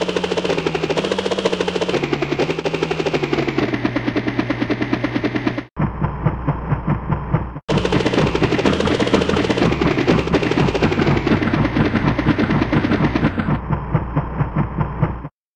helicopter

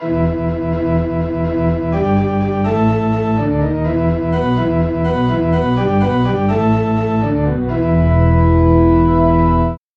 church organ bass

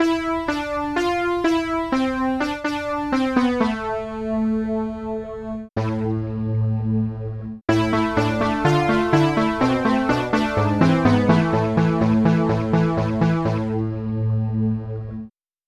synth brass

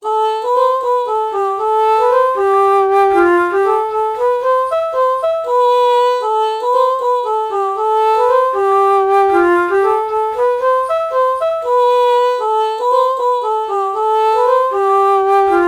ah alto flute false melody morph

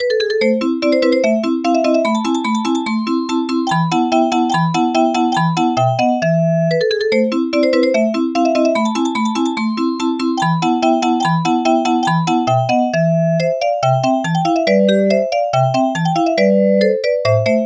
vibraphone

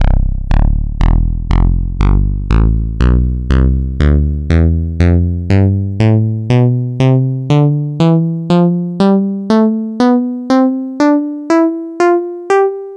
synth bass; tonleiter

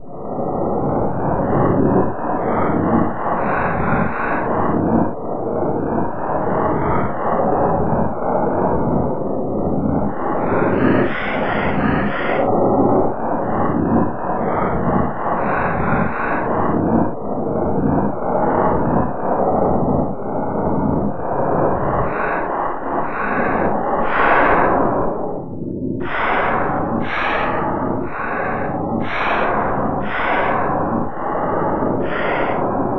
breath noise

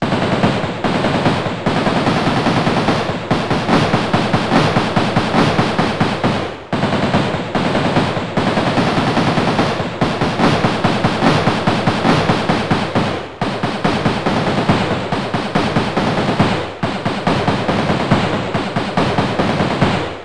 gun shot

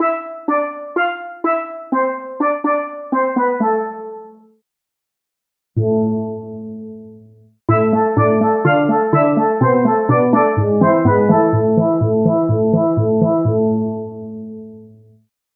steel drums

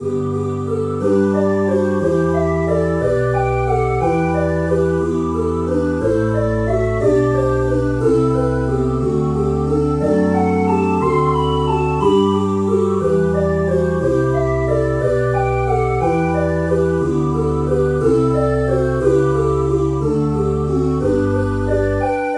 ohh voices

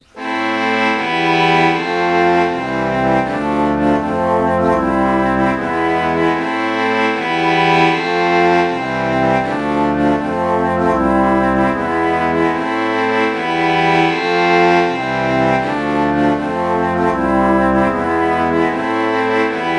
alto flute violin chord morph

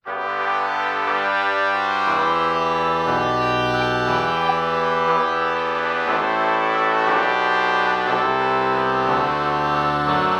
oboe trumpet lpc true bach morph

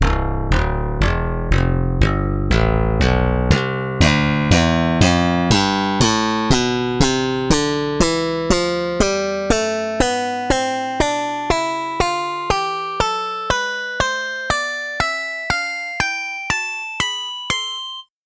pop bass; tonleiter

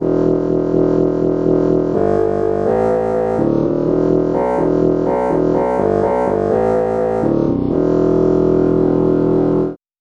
bassoon bass

